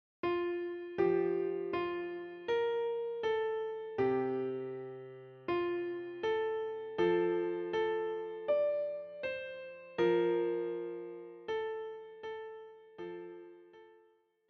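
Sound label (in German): Klavier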